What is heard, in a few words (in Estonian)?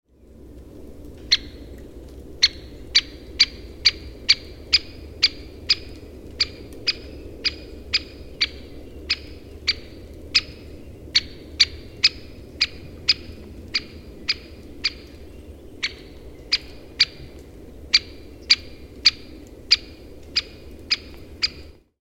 suur kirjurahn haalitsused, Suur-kirjurähn; summer